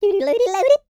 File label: YODEL; ILIO; Vocal Planet